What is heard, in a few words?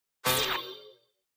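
Electric Bounce Whip Wash, multimedia element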